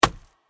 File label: build; New Yankee in King Arthurs Court